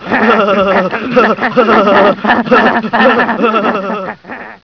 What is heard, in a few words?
laugh